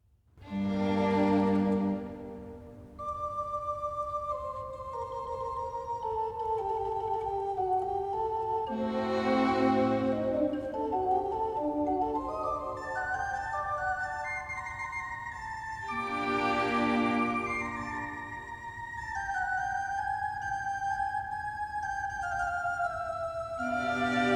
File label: Adagio e staccato, Organ Concertos; Baroque; Handel; Op.4-2 III